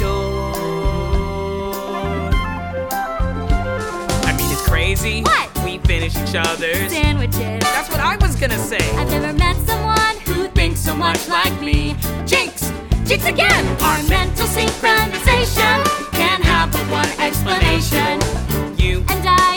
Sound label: callback vocals